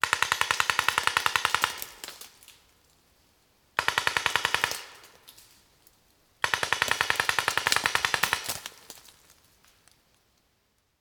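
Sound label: Paintball Gun Impact; Sound Ideas; Guns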